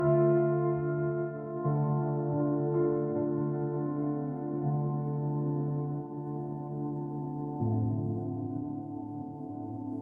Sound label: ringtone emotional